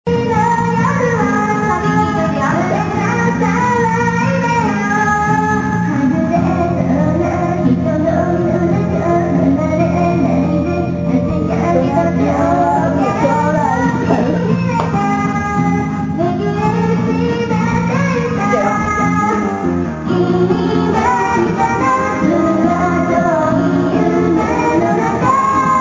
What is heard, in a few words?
karaoke